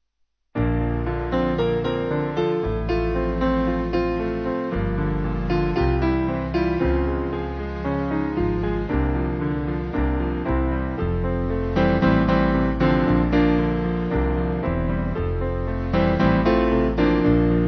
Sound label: Band